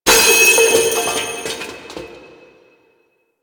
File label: Mask Knock Off; Deacon; Warframe; The New War